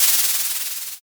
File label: tent